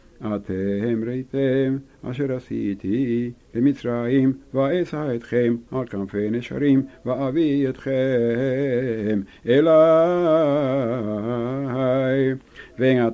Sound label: Parasha repeated, Shavuot